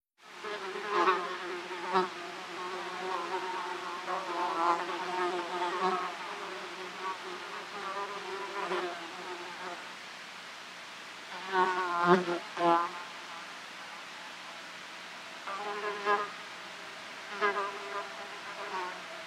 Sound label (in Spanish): mosquito hembra